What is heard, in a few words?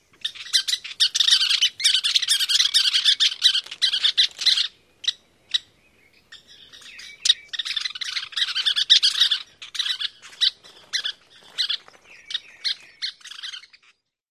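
western kingbird sputtering outbursts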